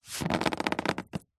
foley luggage leather hard case press down pressure creak